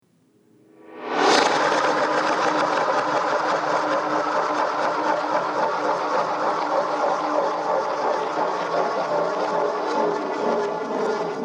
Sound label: asmr jingle